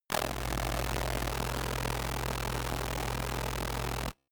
distorted noise, sciene fiction

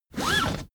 car seatbelt pull fast

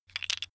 tiles wood; objekte; holz

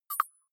click hard high

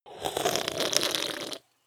slurp tea; koerpergeraeusche; menschen; soundpool

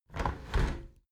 window handle squeak turn close halfway regular; objekte; fenster

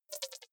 click erratic wide buzz, signale

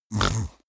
snore; soundpool

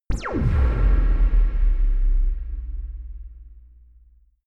low laser blast; krieg-waffen; soundpool

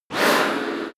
mechanic whoosh

